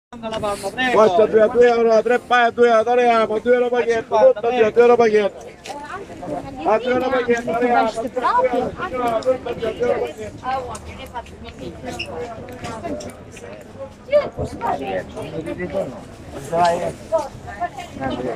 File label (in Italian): Mercato Catania